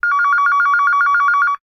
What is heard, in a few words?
Digital Ringtone; Huawei HarmonyOS 2.0; ringtones